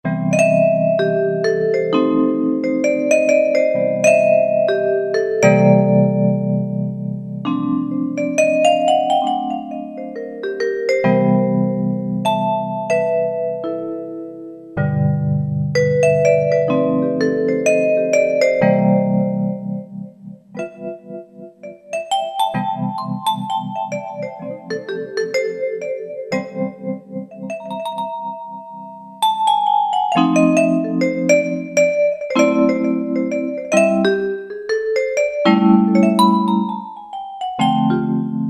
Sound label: PC Vibes, Yamaha TG77; Factory Presets